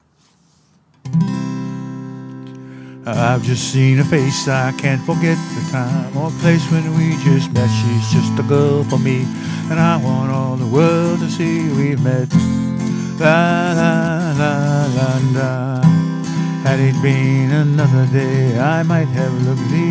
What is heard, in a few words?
falling, acoustic